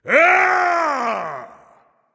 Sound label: yay; smite; khepri